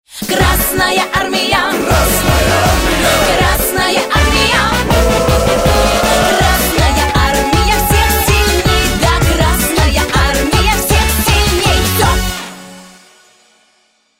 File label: pop